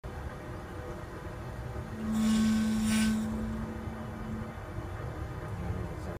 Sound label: Gas meter; کنتور گاز